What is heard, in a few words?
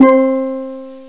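steel drum; gmplay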